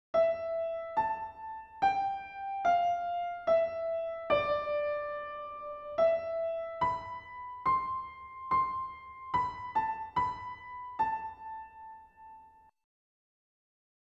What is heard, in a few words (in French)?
ACCORDEON